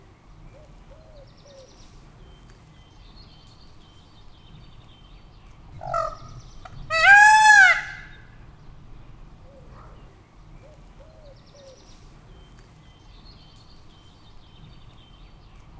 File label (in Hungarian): szóló, páva; pecsi zoo 2017